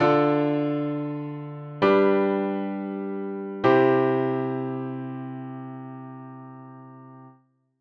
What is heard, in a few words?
piano